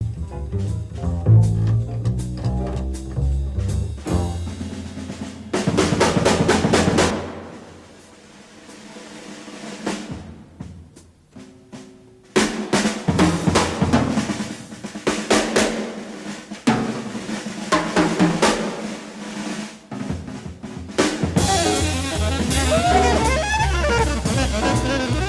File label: best drum solo ever, jazziness